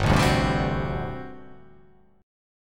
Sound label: G Major 7th Suspended 2nd Suspended 4th G down